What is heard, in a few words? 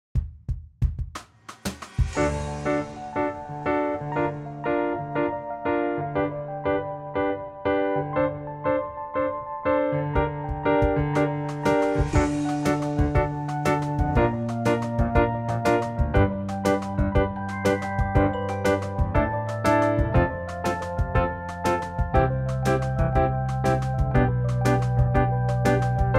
Season Of Us Underscore, Classical Cinematic; Narrative Mind